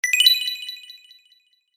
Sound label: Digital Chime Sparkly Alert, also telephone SMS message receive